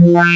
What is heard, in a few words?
ppg add harm, wavetables